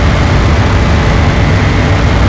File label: Engine Accel